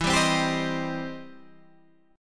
E Minor Major 7th Sharp 5th, E, down, Guitar, Standard; EmM7#5 strummed